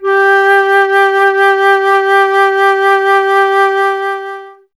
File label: FLT, Solo Instruments; Miroslav Vitous - Solo Instruments VOL-2